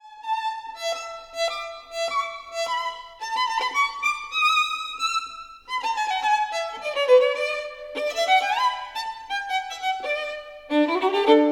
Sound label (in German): ringelspiel